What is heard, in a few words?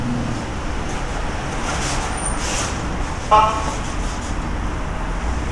Police Pulse